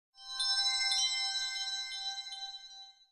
Twinkling Bells

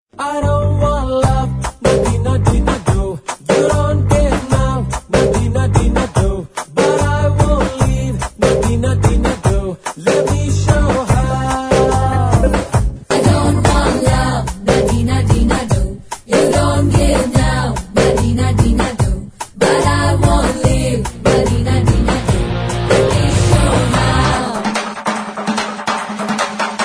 Kick

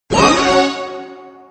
notification

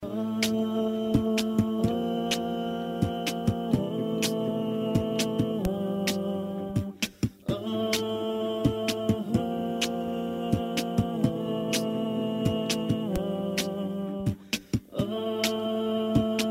Celebration Acapell

